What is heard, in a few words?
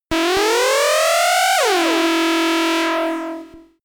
synthetic beam loading; krieg-waffen; soundpool